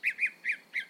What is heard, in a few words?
bird